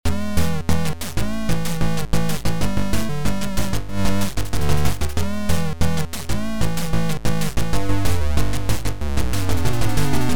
Game music; Tomkin; Zoinks

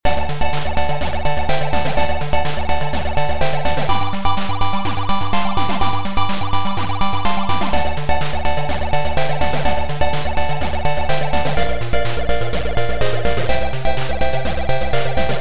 Game music; Mr. Slidey

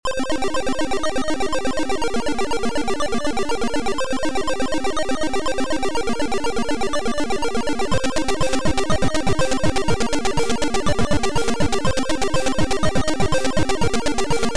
scary wood; Seajeff Scalesmann

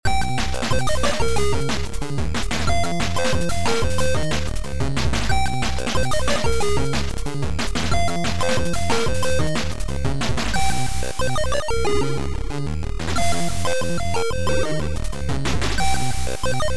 TechnoBeat

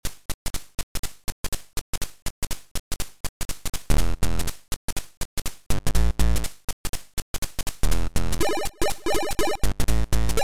Funky Summer; Gibson

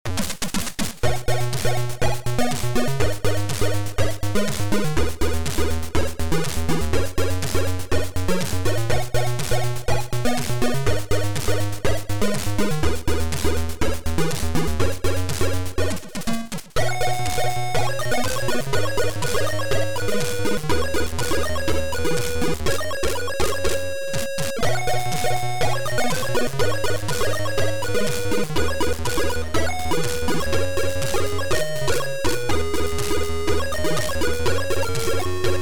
SCALES

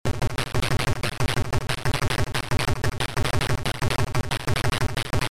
Beat; Loner

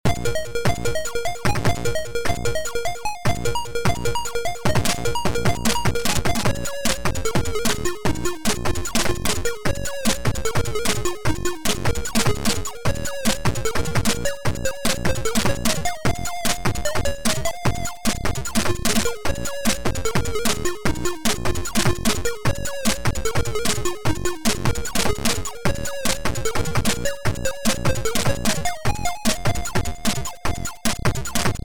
Old Tower gameplay tune

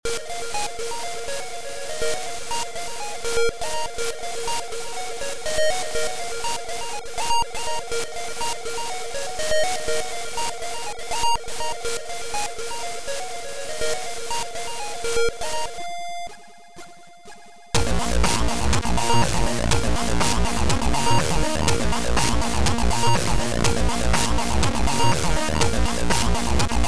ambience epilogue; luchibobra

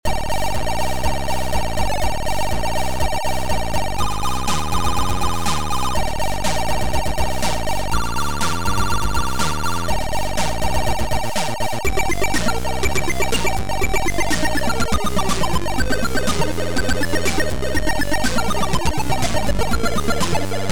JAZZZZZZ

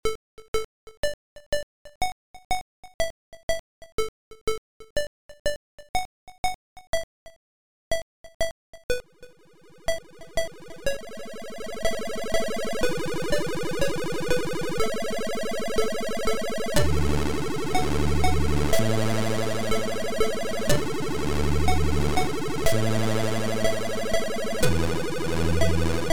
bass version; happybeerday; buzz karabuzz

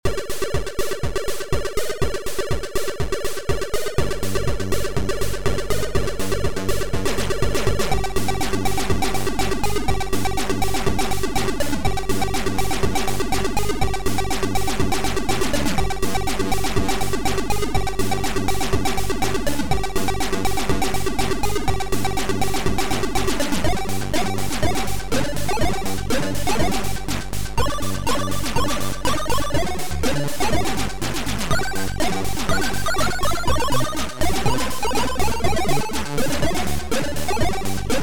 remix; Klav

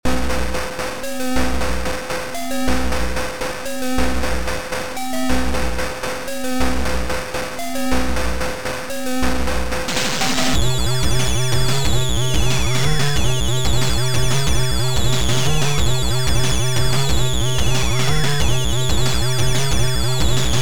rave; Lovele

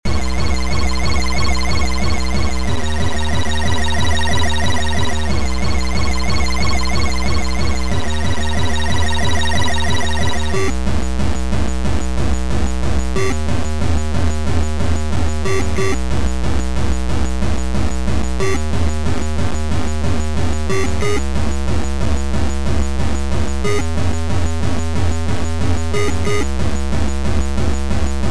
Tape loading o k litle song; Sand

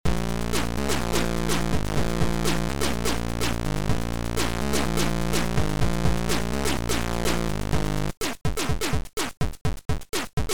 Excellent Techno

